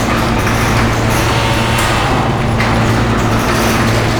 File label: Tram Door Loop; Grineer